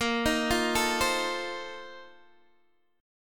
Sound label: Bb Major 7th Suspended 2nd Suspended 4th, Guitar, DADGAD